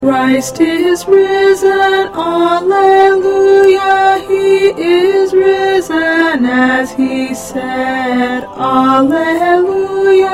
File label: Alto, choir